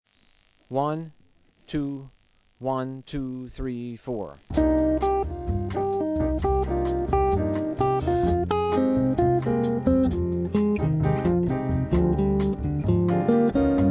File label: Penta Improv